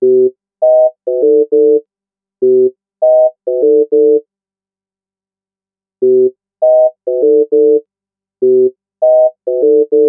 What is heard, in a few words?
Bird; Nokia; PlusFourSix; Lars Edvall